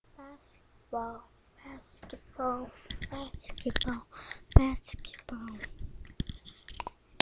basketball

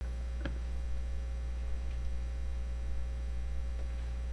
throw

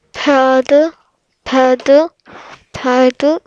pad

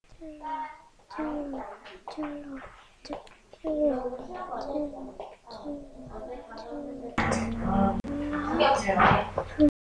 cello

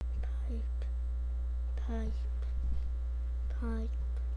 pipe

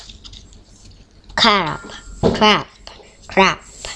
clap

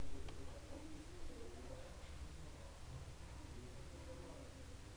coin

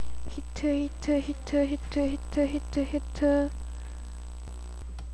hit

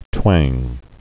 twang

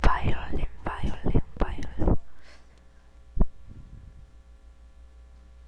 violin

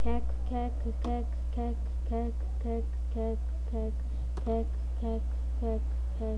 quack

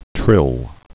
trill

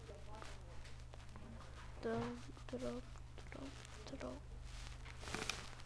drum

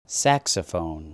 saxophone

